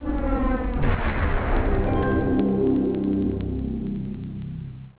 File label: start